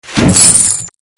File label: chest fall